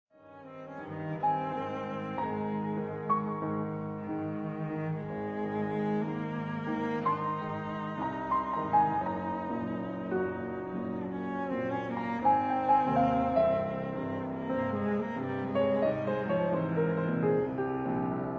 Cello Sonata, for cello & piano, Allegro ma non troppo; Barber; Op. 6